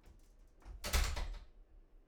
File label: door slam; IEEE AASP